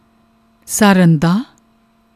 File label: saranda